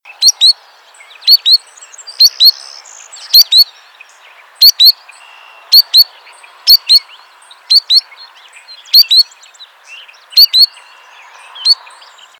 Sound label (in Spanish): playerito manchado